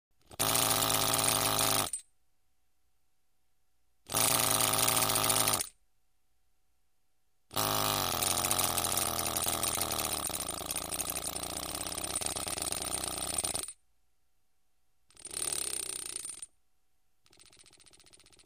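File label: pneumatic rivet gun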